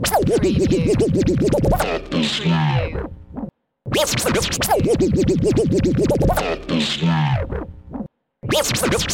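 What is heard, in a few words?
Scratch 105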